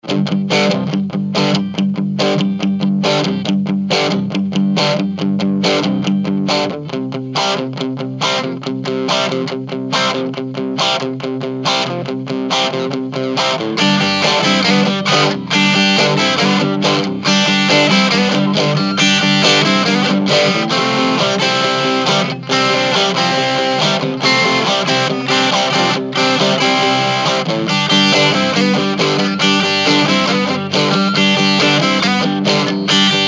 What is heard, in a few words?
joyo vintage overdrive, Vox AC10 Amp